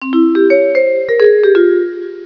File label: VIBES